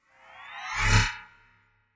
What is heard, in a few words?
Storms Meteor